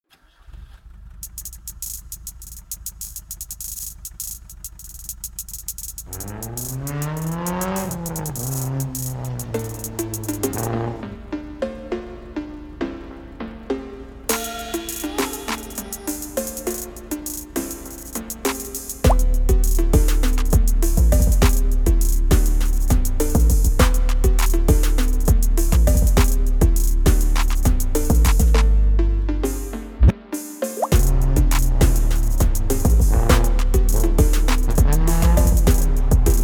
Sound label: atmosphere drifting phonk